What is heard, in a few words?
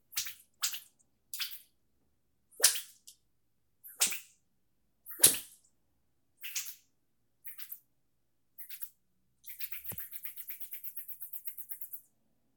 Fishing Rod Swish Swoosh